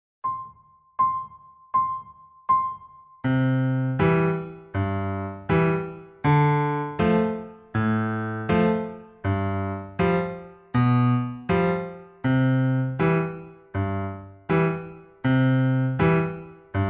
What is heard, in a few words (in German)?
Zauberklavier 80 Secondo; Uebungs-CDs; Playbacks; Aura Lee